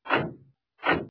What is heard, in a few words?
car boot slam